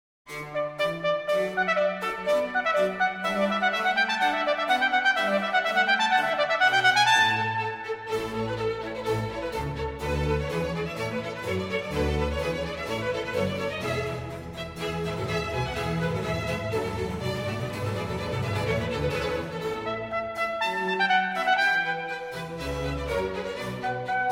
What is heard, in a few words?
Concerto for Trumpet, Strings and Continuo Allegro; Telemann; Trompetenkonzerte